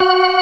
ORGAN ALL STOPS; Keith Emerson Signature Series

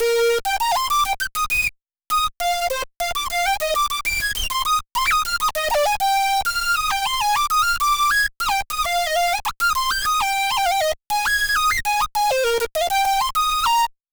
Lead

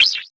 Spitter Kick, Esoterik Beatz; Spitter Kit

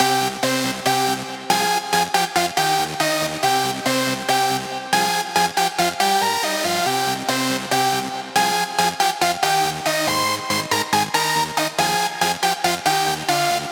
140BPM C; Vengeance - Essential Clubsounds Vol.4

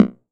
Tom Delay, TR-606 Bent Samples; Sonic Foundry (Sony Creative Software); The Electronic Music Manuscript Collection; Battery 3 Sample Kits

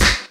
SD, Dance Mega Drums; Best Service; SD-HIP

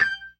PERC, Synth and Organ

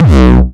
909 Grime Layer 2 - B1, Drums, Kicks; Mbase 11

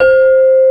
CELESTA; East West; Global Sounds

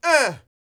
YELL, MALE HUMAN; Spectrasonics Vocal Planet

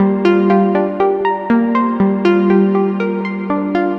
Morning Light, Bells & Tines; Sonic Foundry; The Electronic Music Manuscript Collection

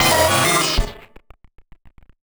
Malfo Syn, malfunctioning synth

